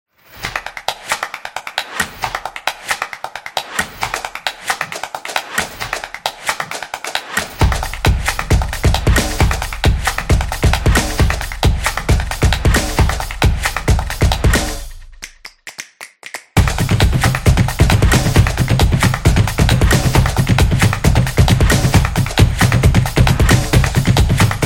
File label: Percussion Action Teaser